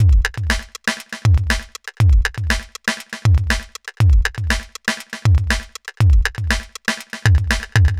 NRG Break, Trance, Drums, Breaks

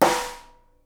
SNARE, Live Snares

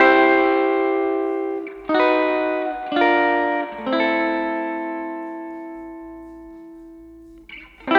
Guitar, 70s Analog Funk; Sonic Foundry (Sony Creative Software); What it Is!; Classic Caddy

